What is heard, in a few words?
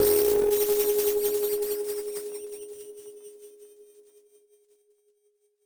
Battered Synth; malfunctioning synth samples